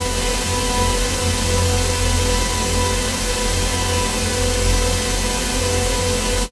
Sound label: Giant Saws, pad